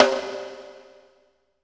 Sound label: HIT LONG, PROC SNRS; Sampleheads; New York City Drumworks VOL-1